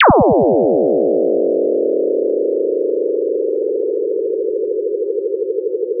impulse response

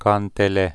kantele

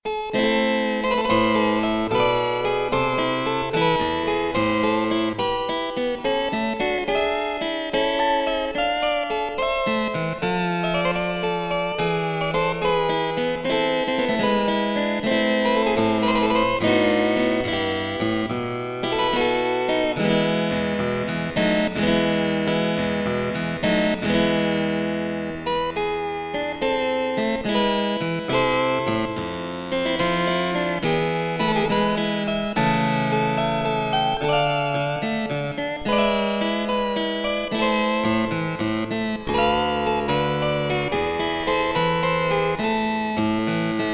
harp wow; antiquing

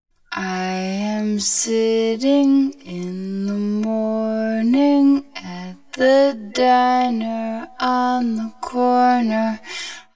vocals

